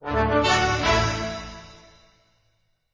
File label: Victory Flourish